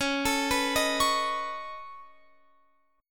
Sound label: Db 7th Suspended 2nd Sharp 5th Db, down, Guitar Open F; Db7sus2#5; arpeggiated